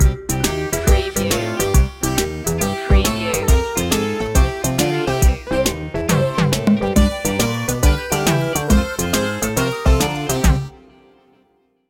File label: retro video game blues stinger